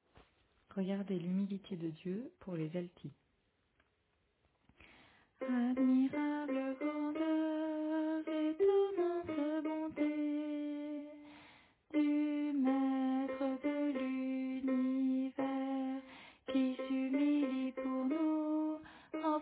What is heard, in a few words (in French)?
Alto